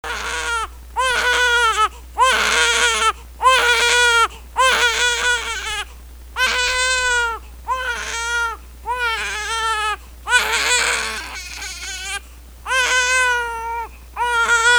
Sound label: cry